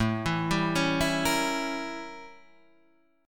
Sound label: A 7th Flat 9th Guitar Standard; A7b9 arpeggiated; JGuitar